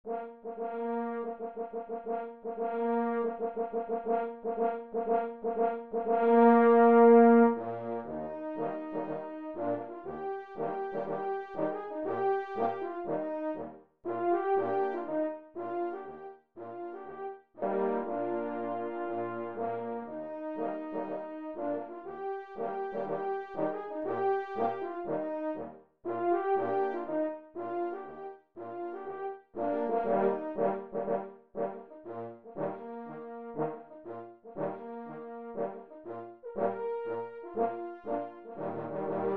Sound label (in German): Triumphmarsch; aida